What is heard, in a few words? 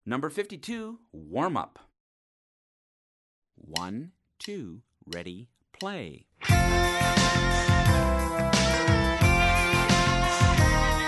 warm up